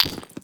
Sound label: gem up; gems